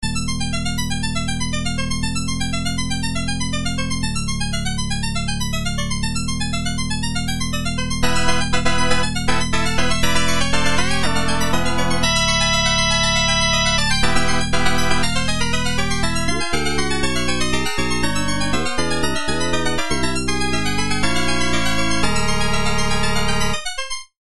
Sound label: kuso synth